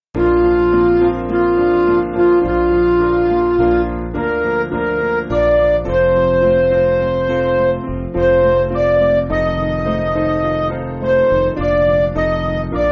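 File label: Midi, Music Backing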